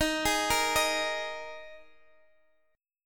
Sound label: D sharp Suspended 4th D sharp; chord shape